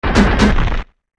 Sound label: Goht Gallop; Goht galloping (2 pitches)